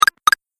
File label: Pickup App Game; Video Game Sound Effects